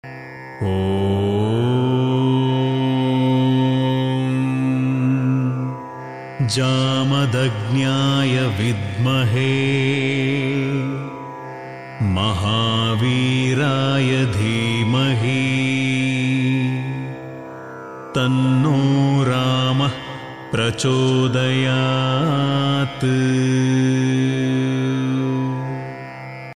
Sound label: parashurama gayatri